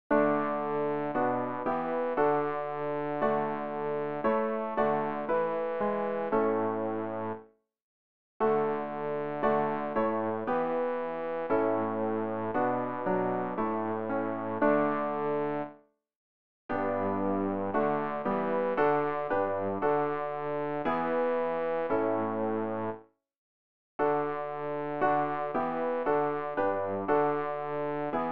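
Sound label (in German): bass, Übehilfen; Ref. Gesangbuch; RG 66: Nun danket Gott, erhebt und preiset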